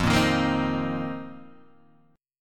F 7th Suspended 4th F down Guitar Open G

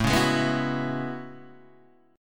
A Major 13th, Guitar DADGAD

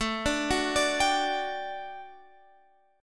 D-Major Add 11th A down Guitar Standard; arpeggiated; JGuitar

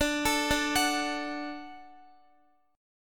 D Major D down Guitar; arpeggiated; tuning 4E,4A,5D,5G,5C,6F

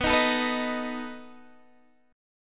B 7th B down Guitar Open D

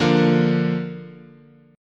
D Minor Sharp 5th, guitar chord

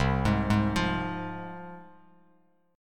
C Suspended 4th Sharp 5th, C, down, Bass Standard; Csus4#5 arpeggiated; JGuitar

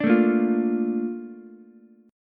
A sharp Major 7th, Ukulele; A#M7; strummed; JGuitar

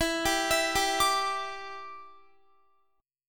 E Minor 7th, down, Guitar; Em7; arpeggiated

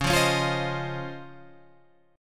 C sharp Major 9th, Guitar, Open G

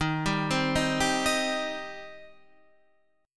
Eb 6th Eb down Guitar Standard; Eb6 arpeggiated; JGuitar

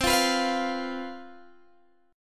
C Major 7th Suspended 4th, down, Guitar, DADGAD

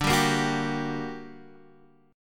G Minor Major 7th D

